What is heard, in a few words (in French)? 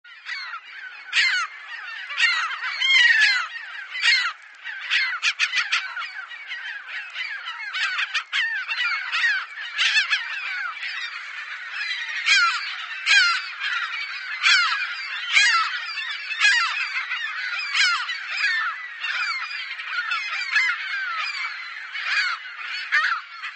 chant